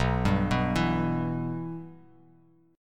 B Suspended 4th Sharp 5th B down, Bass Standard; Bsus4#5 arpeggiated; JGuitar

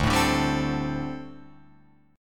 D 7th Suspended 4th, down, Guitar, Open F; D7sus4; strummed

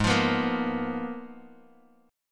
Ab Minor 6th Add 9th, Ab, Bass Standard; strummed; JGuitar

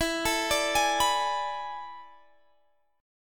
A Major 7th Suspended 2nd Suspended 4th E, chord

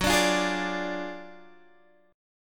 D Diminished F sharp

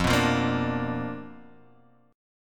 B Minor Major 7th Flat 5th, chord

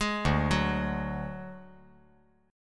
D-Major Flat 5th, D, 5G, 4C, 5E, 5A

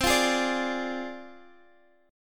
D Minor 7th Flat 5th C, guitar chord

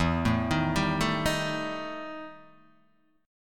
E Minor Major 7th Double Flat 5th, Guitar, DADGAD; chord shape

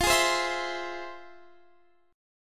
F Minor Major 7th, Guitar Standard, chord; FmM7; x,20,18,17,17,20; strummed; capo=2

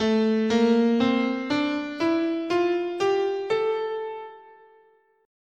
A Phrygian, scale

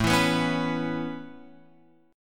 A Major Add 9th A Guitar Open F, chord; Aadd9 strummed; JGuitar

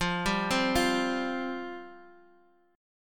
F Suspended 2nd, down

